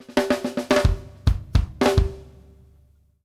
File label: OFF SNARE, DRUMWORKS FILLS 108